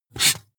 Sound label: marker mark hard short